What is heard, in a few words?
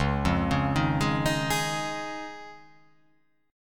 C sharp Major Add 9th; x,4,3,6,3,3,5,5